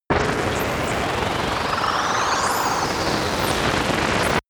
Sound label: facility error charge, sciene-fiction